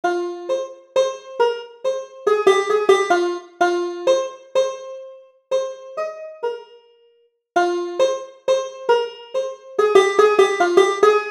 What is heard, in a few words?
Banjo